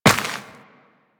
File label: Step Dirt, Footsteps Walk; Warframe; Tenno